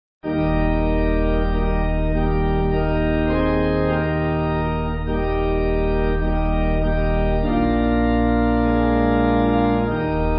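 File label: Pipe, pipe organ; Break Thou The Bread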